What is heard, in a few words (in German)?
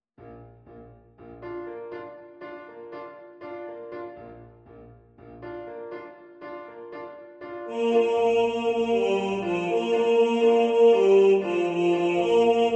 Bass 2 im Vordergrund, Männerchor